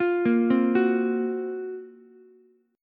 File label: F sharp Major 7th Flat 5th, Ukulele; arpeggiated; JGuitar